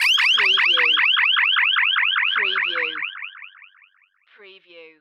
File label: Alarm High Pulsar; sound effects bible